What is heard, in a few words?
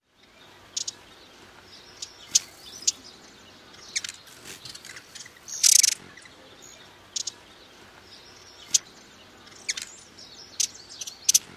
aggressive calls; AZ